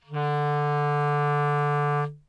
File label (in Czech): klarinet